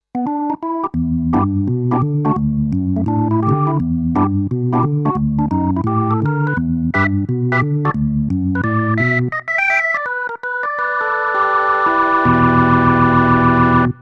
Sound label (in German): Kawai MP7SE Tonewheel Orgel